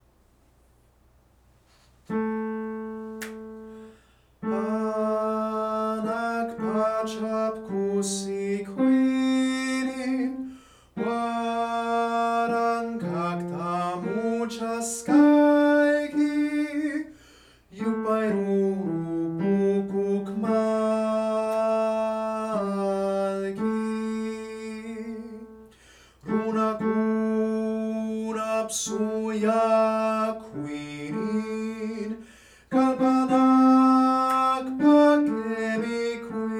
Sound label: tenor